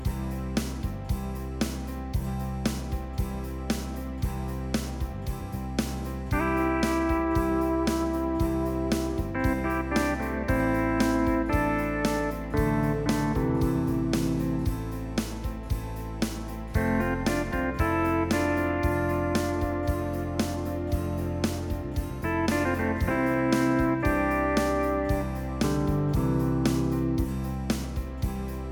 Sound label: Guitar